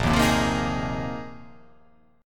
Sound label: A sharp 7th Suspended 2nd Suspended 4th